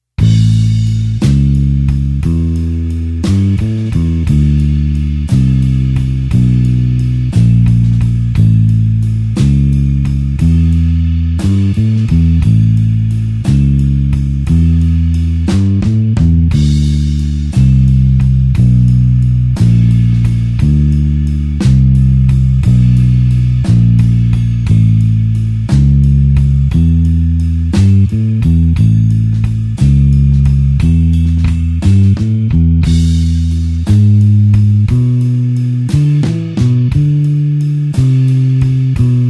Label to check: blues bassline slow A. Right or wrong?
Right